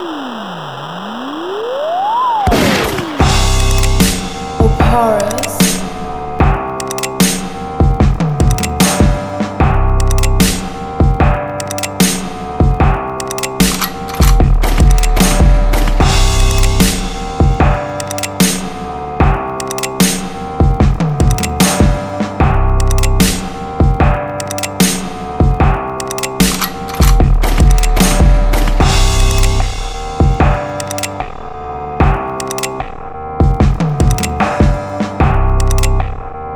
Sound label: 75BPM